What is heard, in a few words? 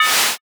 slash heavy